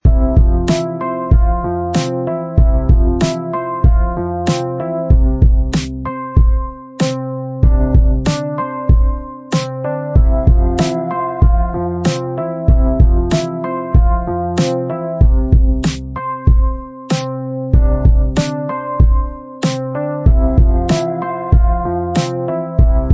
romantic

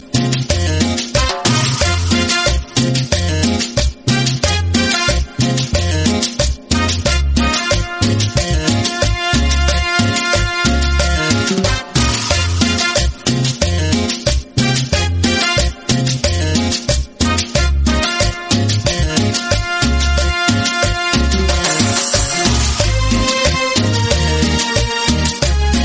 cumbia; goku

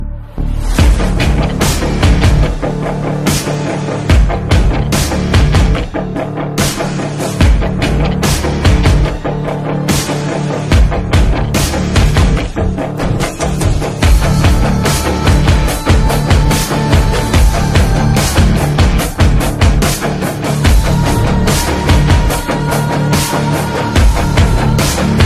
dubstep; 47 ronin

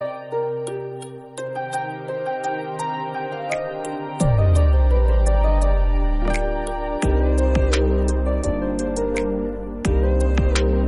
tamil romantic ringtone

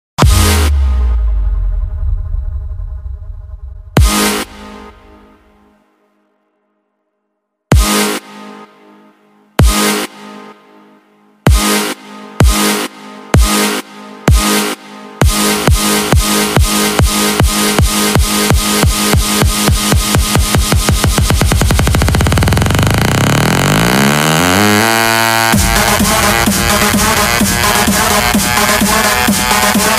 bring that beat out

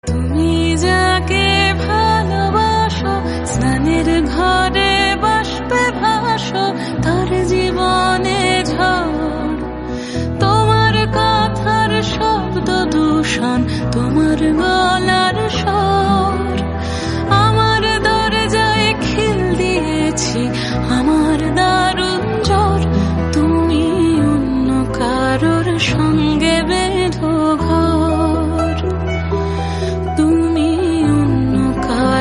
bollywood song ringtone

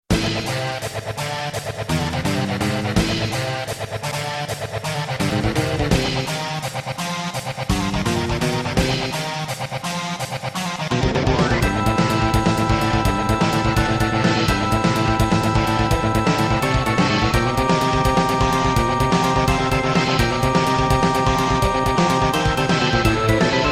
fighting theme; final fantasy vii

